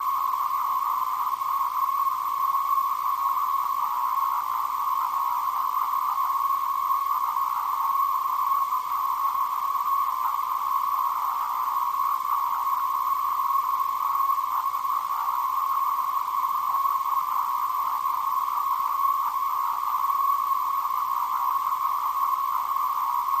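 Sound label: Sputnik Old School Satellite, communications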